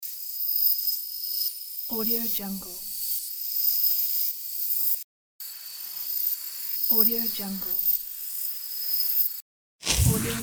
wizards attack

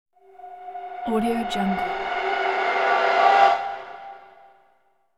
eerie synth reverse stings

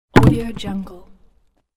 falling big wood log

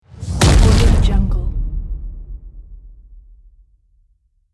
hit explosion

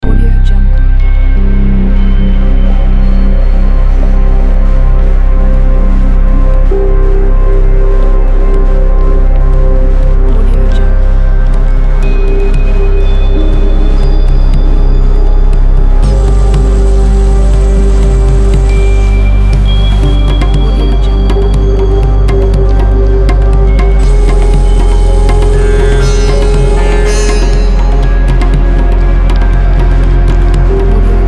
indian ambient

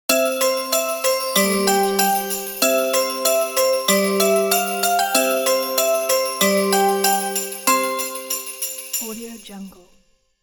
christmas logo

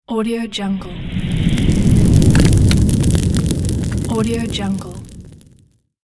fire reveal

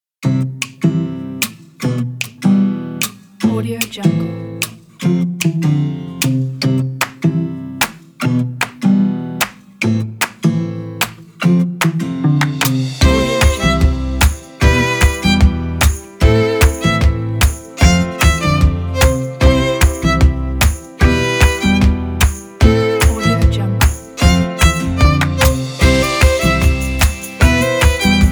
happy folk violin

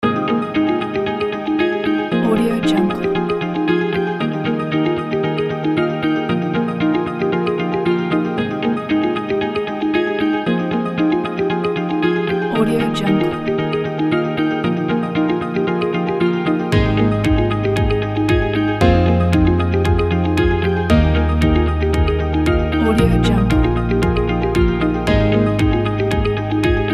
elegant inspiring corporate loop

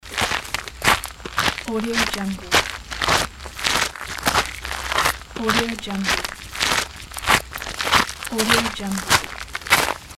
walking on gravel

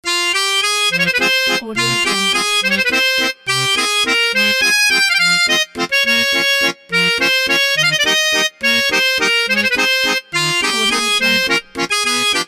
french accordion theme

